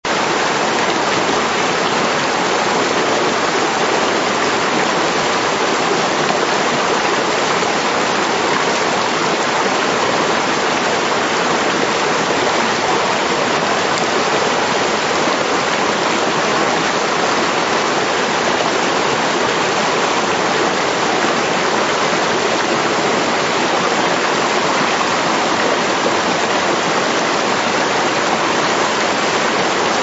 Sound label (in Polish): himalajski potok